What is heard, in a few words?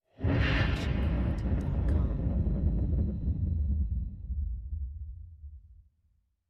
Industrial Rise; soundscrate